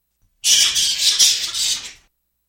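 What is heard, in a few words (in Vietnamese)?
rối kêu khi tranh giành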